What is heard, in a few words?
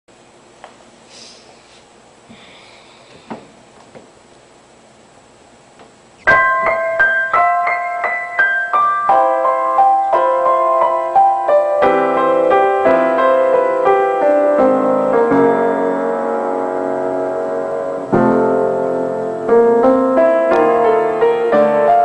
koi gokoro piano; cardcaptor sakura